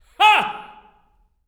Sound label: LAUGH, OP. LAUGHS; Spectrasonics; Symphony of Voices